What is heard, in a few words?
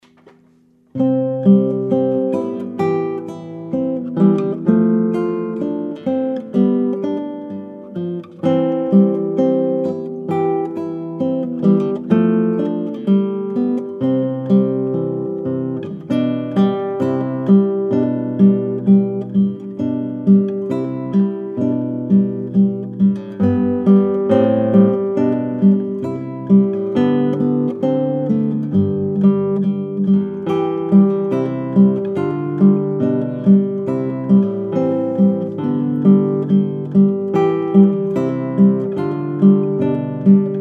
Melody In C